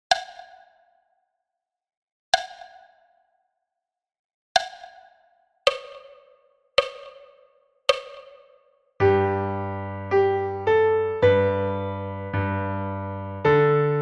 Le xylophant tutti a 54 bpm

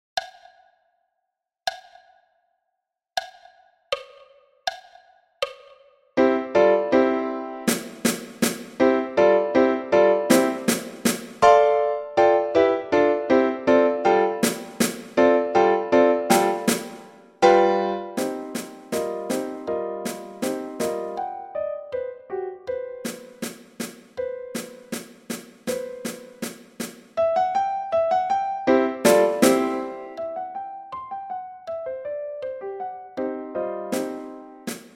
Piano et caisse claire a 80 bpm

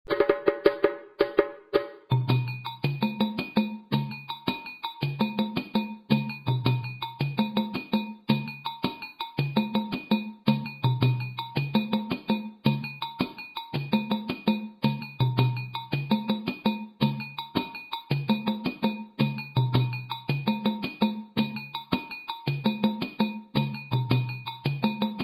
Soli des Manian Dununs

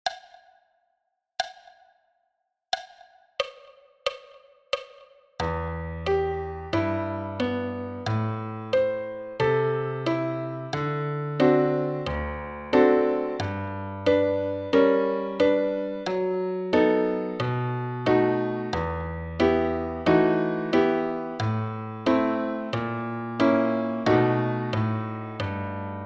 piano solo a 60 bpm; a 90 bpm

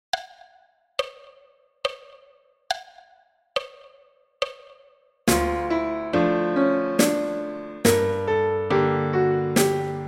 piano et caisse à 70 bpm

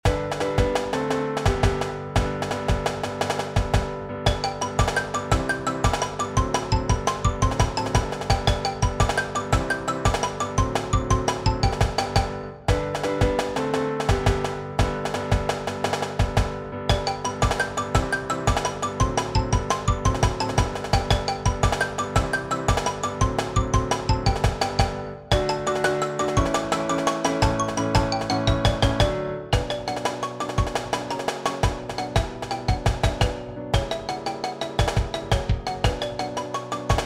piano percu xylo a 114 bpm

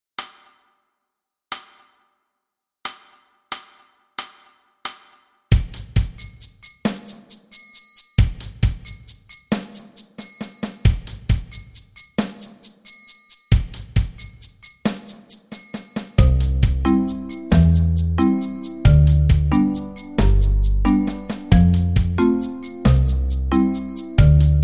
Danses de lours dor accompagnement a 90 bpm

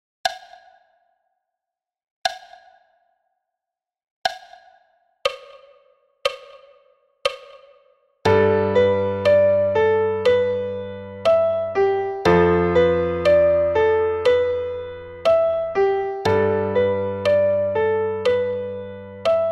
Julien reve 60 bpm

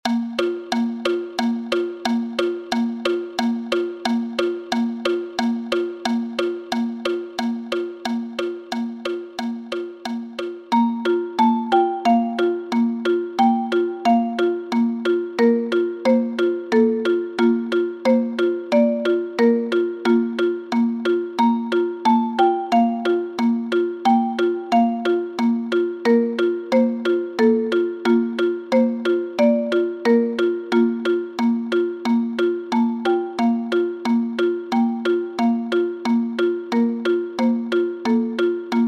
Danse chinoise

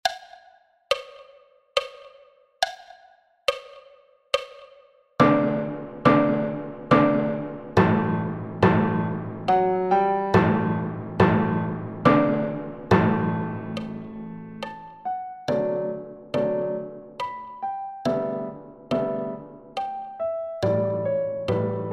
timbales et piano a 70 bpm

